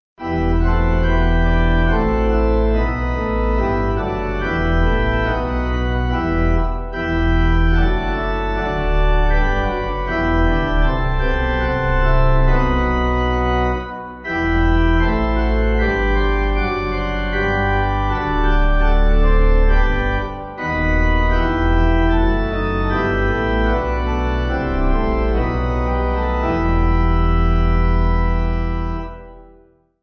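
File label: Pipe, Pipe Organ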